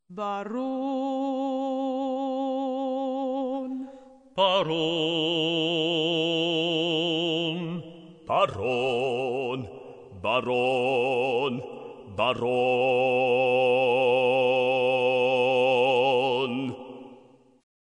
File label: C Dur Alto Tenore Basso; Notenscherze in Briefen